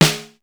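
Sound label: lo pure snare; sr16 sd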